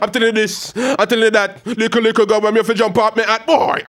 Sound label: HAL RAGGAM, RAGGA-MUFF A maj